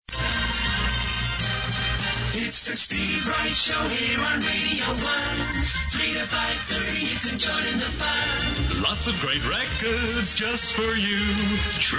show jingle; steve wright

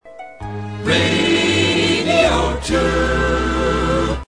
jingle

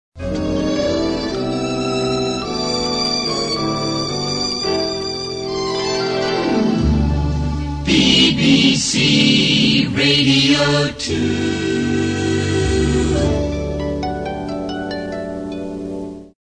jingle

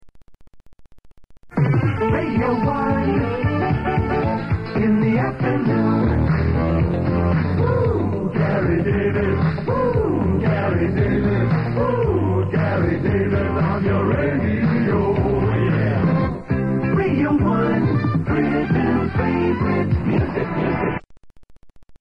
ooh; gary davies

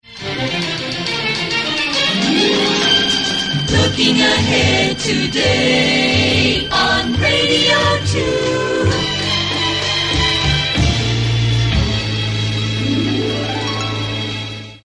jingle

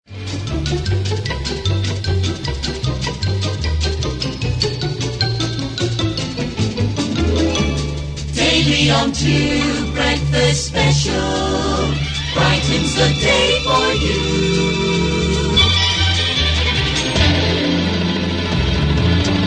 jingle

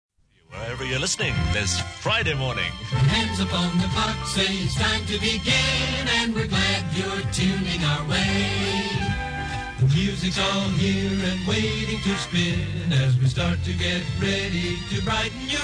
jingle; hands upon clock

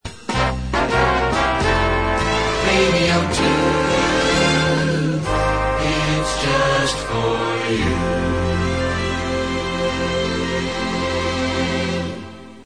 jingle

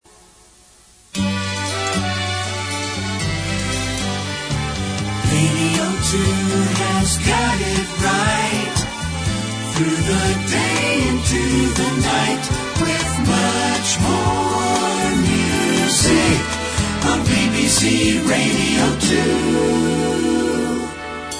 jingle

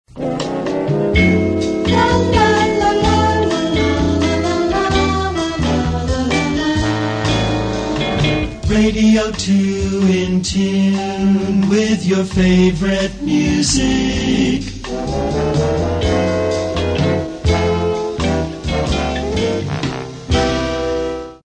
jingle

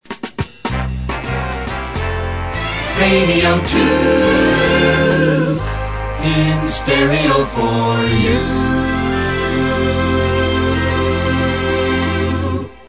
jingle

